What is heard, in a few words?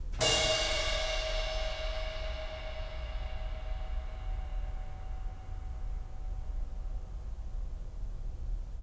crash cymbal